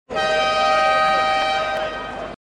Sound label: Basket Horn